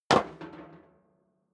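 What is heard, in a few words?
step sneaker metal rattle stair up, footsteps; schritte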